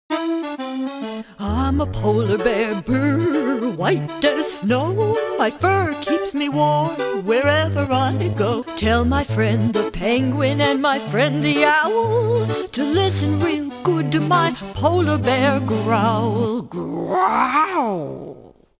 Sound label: Polar Bear Song